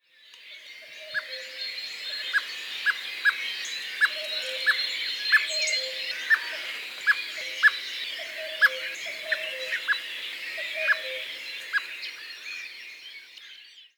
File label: Common Blackbird Turdus merula